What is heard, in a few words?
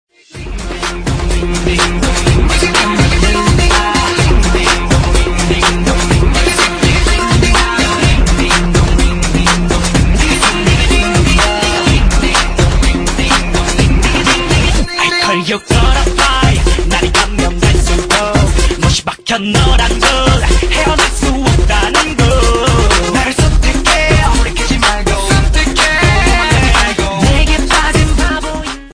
ding dong ringtone; for android